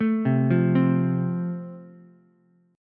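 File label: A Minor Sharp 5th, Ukulele, chord strum; Am#5 arpeggiated; JGuitar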